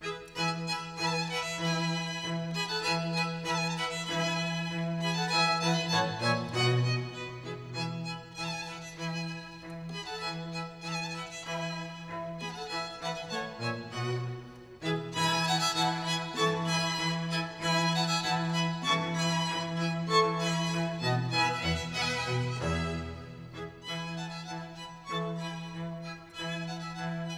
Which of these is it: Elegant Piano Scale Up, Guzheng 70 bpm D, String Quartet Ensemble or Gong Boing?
String Quartet Ensemble